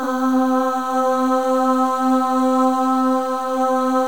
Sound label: Voices, FEM AHS; Spectrasonics; FEM.1 AHS